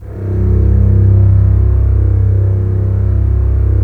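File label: STR CBS, Strings; Roland - LA Composer vol. 4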